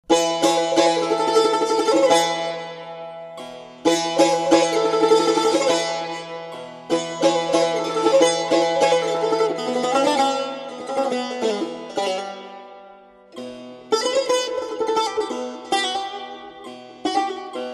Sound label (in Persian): Saz